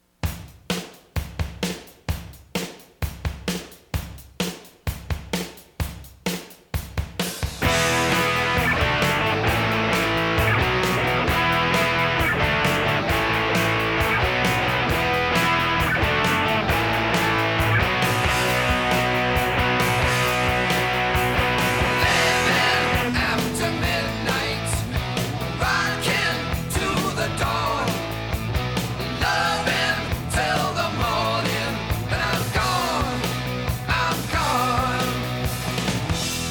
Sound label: levelup